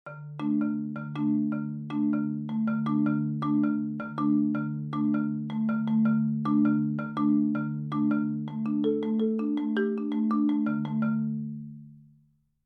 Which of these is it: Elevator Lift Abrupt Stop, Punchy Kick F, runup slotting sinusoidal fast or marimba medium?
marimba medium